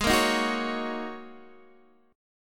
Ab 7th Suspended 2nd Suspended 4th, Guitar, DADGAD